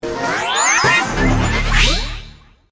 digi in, buttons; digilab

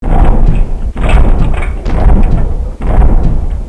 train

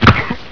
player fall pain

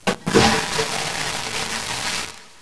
flush

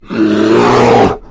bear jump attack

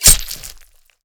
hit, knife

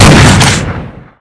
m1887 fire

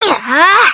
charge

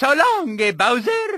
big attack; mario64

